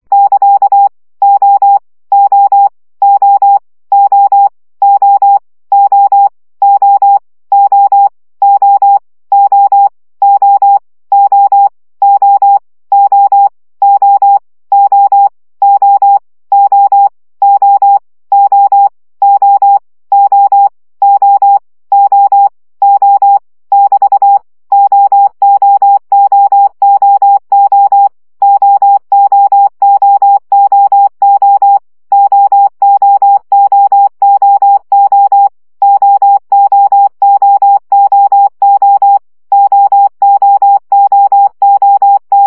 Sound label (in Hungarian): CW gyakorlas o2 20 baud